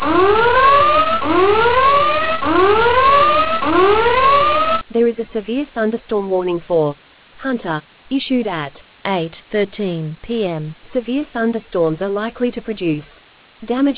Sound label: storm audio